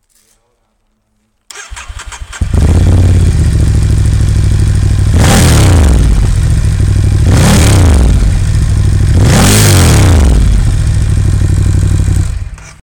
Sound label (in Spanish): Sonido Ducati Scrambler